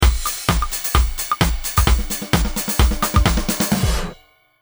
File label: Stick Man, stingers